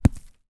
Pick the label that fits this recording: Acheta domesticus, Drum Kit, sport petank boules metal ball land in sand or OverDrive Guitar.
sport petank boules metal ball land in sand